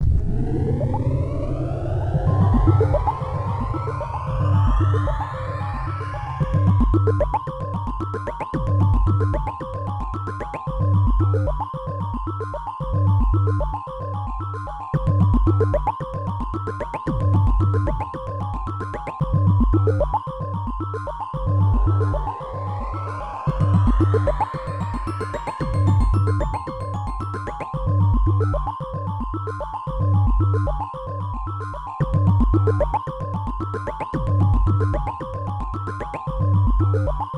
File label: Main Ambience; solvalou namco system 21 arcade